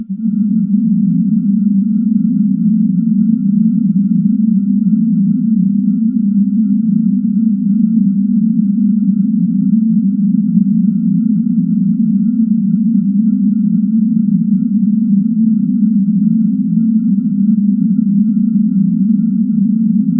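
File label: a gentle clicking vibration, haptics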